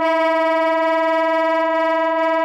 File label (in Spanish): Tremolos